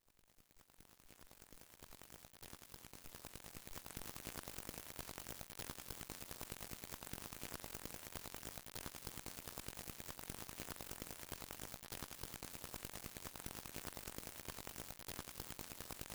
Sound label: noise drone